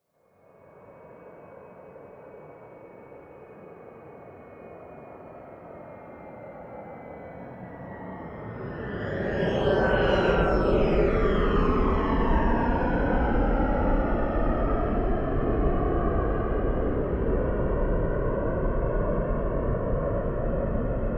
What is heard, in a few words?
plane bombardier private flyby right to left distant